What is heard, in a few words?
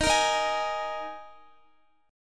Eb Suspended 4th Eb Guitar 4E 4A 5D 5G 5C 6F; Ebsus4; strummed